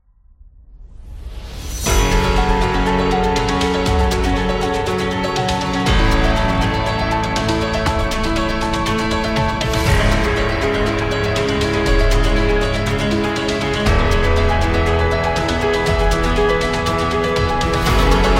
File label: News Epic; documentary